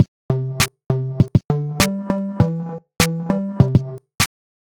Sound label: R&B Beat, 100bpm; 8-beat R&B instrumentals from sounds extracted from chart song